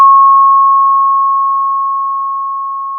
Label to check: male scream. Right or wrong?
Wrong